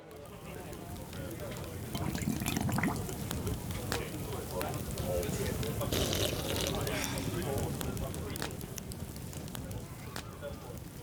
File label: Crowd Ambience; Pitch Black Audio Pong